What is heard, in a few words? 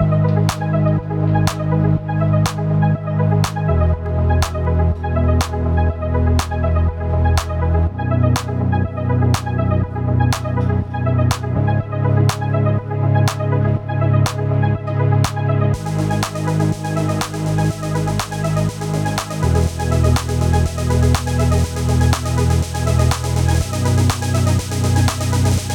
full mix g min 122 bpm deep house